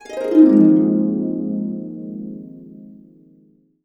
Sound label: Harps; USB Soundscan vol.62; Complete Harps; AKAI